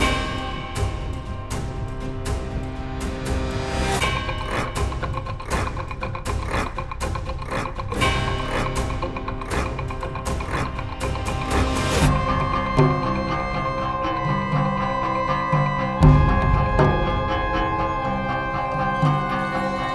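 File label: music fight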